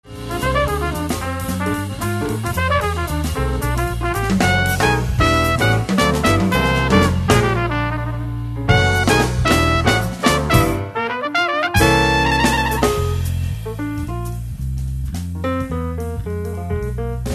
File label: acoustic quartet blues